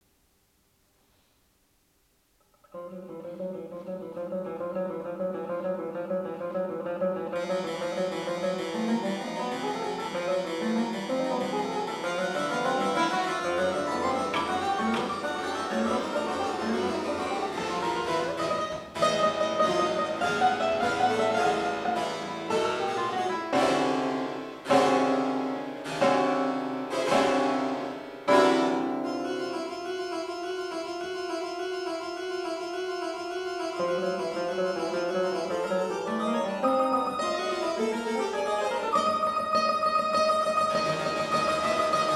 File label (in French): Guit